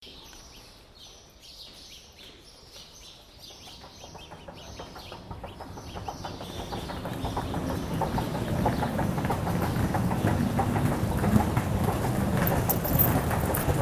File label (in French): ambiance